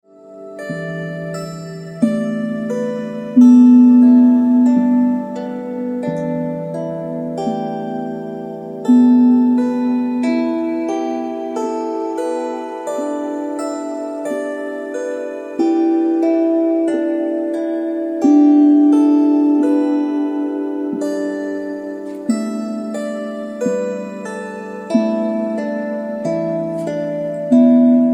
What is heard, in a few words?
lyre